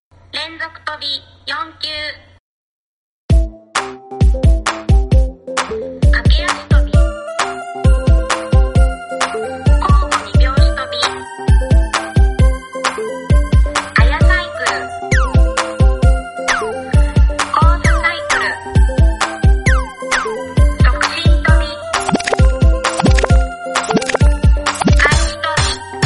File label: rhythm rope